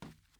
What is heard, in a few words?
wood step